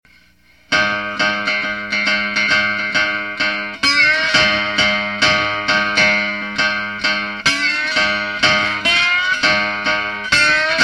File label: Slidin' Cigar Box Blues